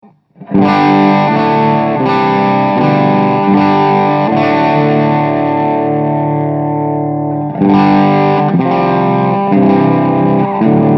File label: düsenberg Steg Pot Chords